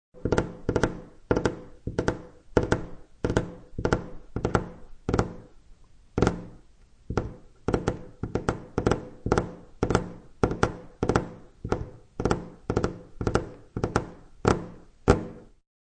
Fngr Nls Tpng, Lady impatiently tapping fingernails.; Finger Nail Tapping 1; Human